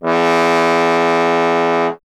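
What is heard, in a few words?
bass trombone; Miroslav Vitous; Solo Instruments VOL-1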